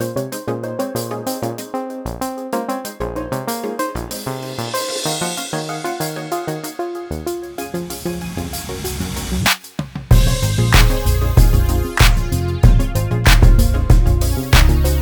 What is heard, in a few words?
bpm95